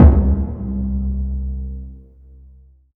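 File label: bass drum, right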